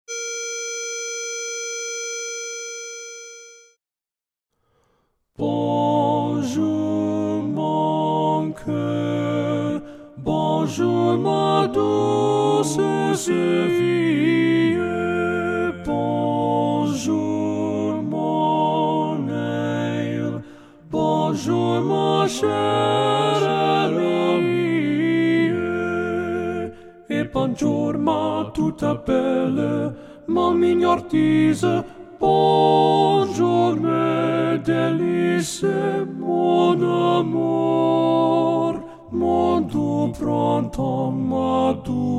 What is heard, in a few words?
Tenor